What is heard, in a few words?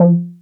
303 F4, Bass